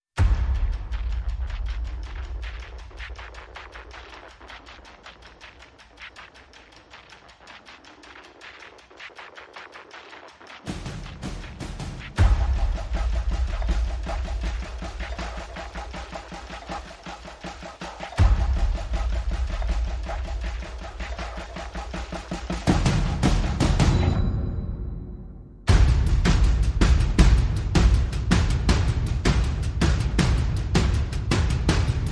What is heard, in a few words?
Perc and FX